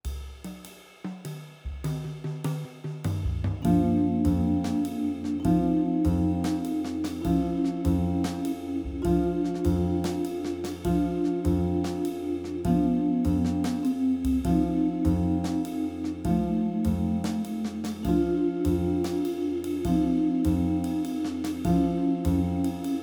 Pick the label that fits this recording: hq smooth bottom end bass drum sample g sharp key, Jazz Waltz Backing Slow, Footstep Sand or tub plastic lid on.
Jazz Waltz Backing Slow